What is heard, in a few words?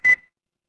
ui beep